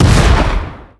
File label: rocket explode